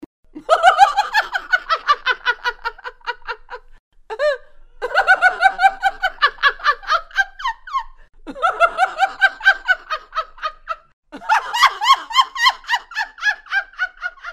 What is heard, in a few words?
famous laugh